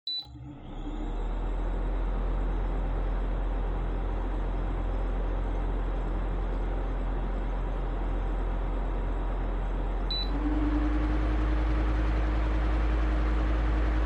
Electric Fan All

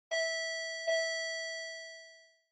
Intercom Ding Dong Far

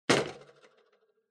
Kitchen Scale Weigh Set

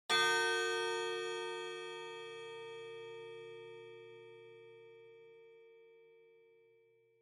Pendulum Clock Chime High

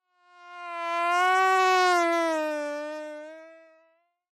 Mosquito-Real

